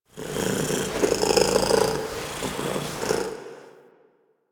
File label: Down Idle; Arsenal Lair; Warframe; Kalymos